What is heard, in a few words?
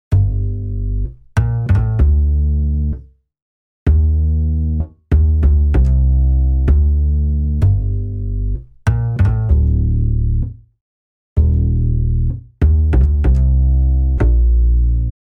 Bass 808s Lo Fi Before